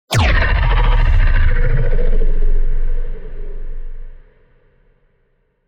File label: Low Cinematic Impact